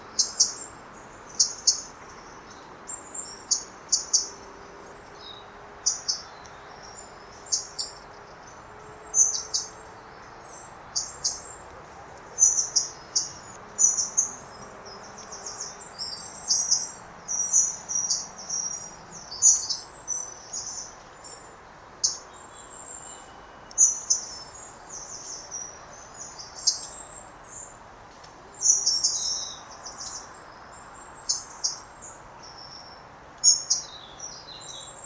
Pilkoji Musinuke - Spotted Flycatcher - Muscicapa striata